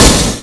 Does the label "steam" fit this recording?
Yes